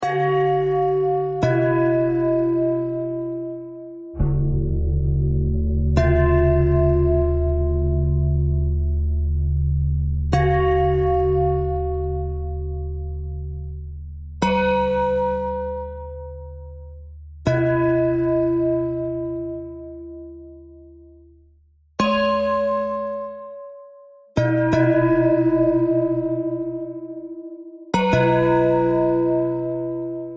bell thread bend thread; bells

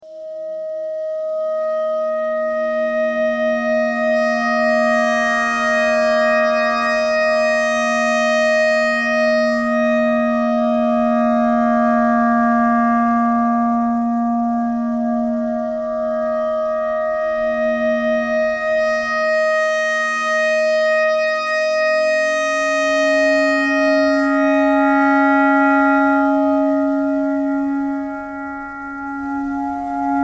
clarinet clarinet notes e thread pentatonic

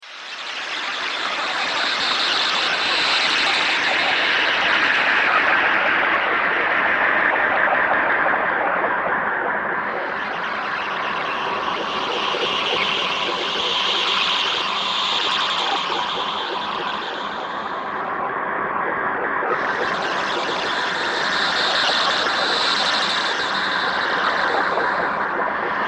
weather radio thread noise all

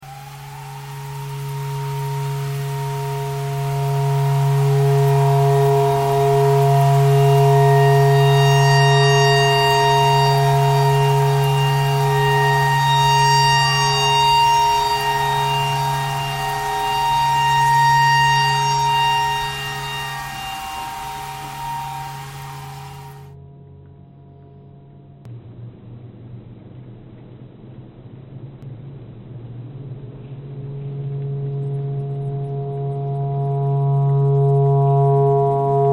tornado siren clarinet thread pentatonic

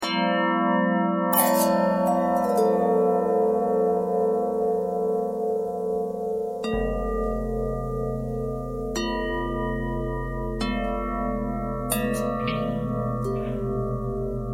long bell bell harmonic twist, bells

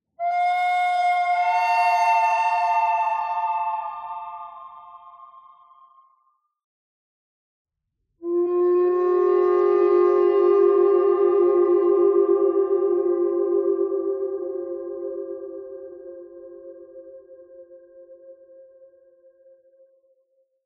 bird thread pentatonic; noreverb

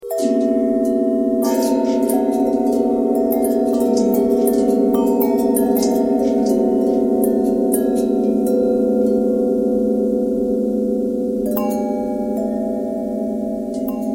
longbell bell bendtwist

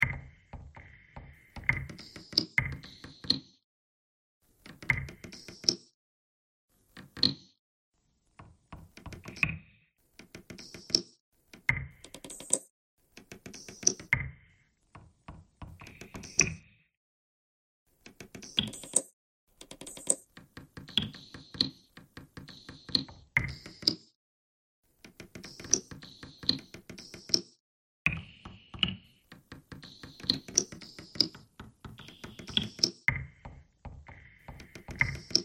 bagpipe buzz

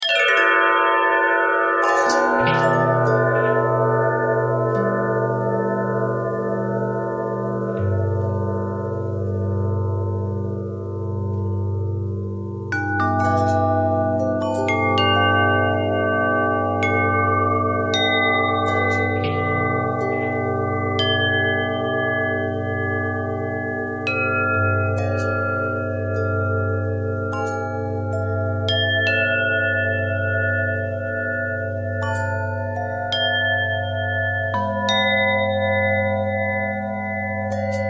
long bell bell twist

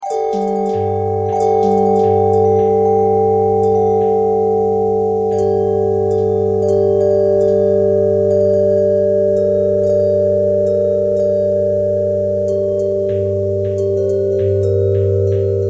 bell harmonic twist

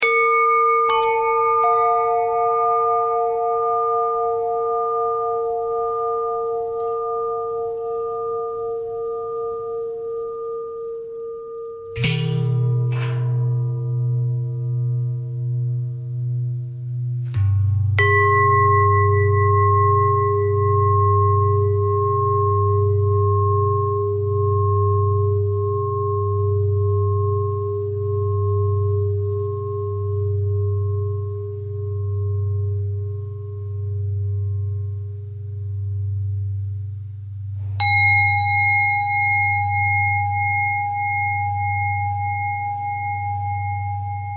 long bell bell threads, bells

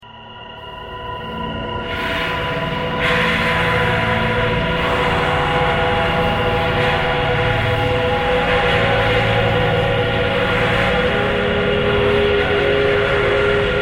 thread pentatonic

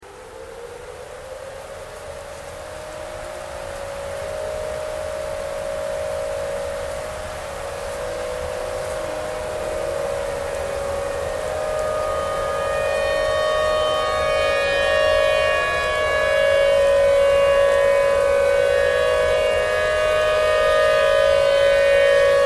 tornado siren thread noise

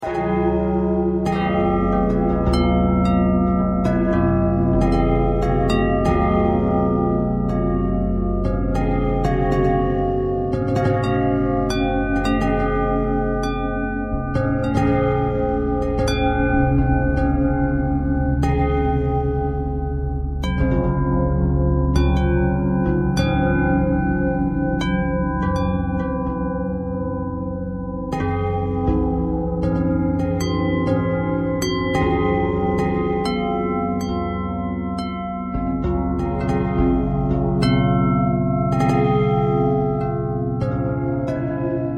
bell bell thread pentatonic, bells

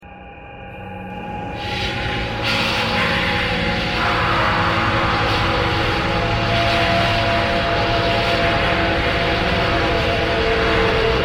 thread pentatonic

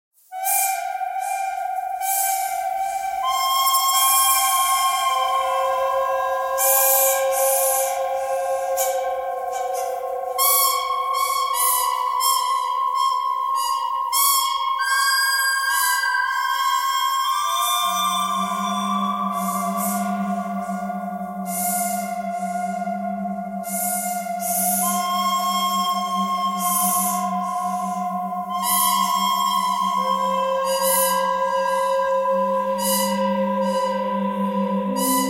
bird cry bird canyon thread buzz

